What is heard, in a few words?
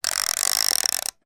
timer plug wind slow